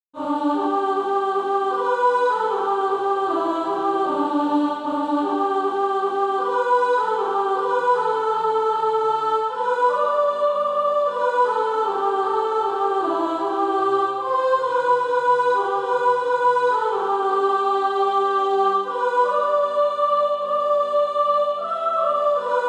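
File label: soprano, Practise Music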